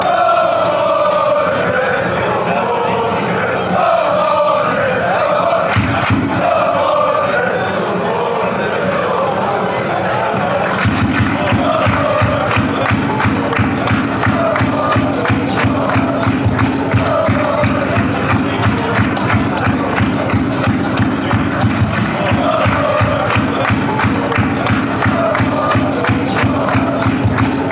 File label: come you reds, chants